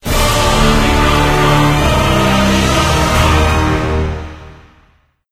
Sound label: Ominous; Dungeon Link; Android